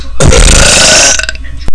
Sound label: burp a high